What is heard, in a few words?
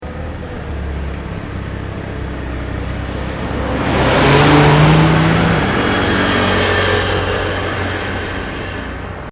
Truck Lorry Large Japan Passing; Generic truck sounds; Truck Sound Effects; Vehicles